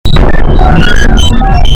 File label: Loop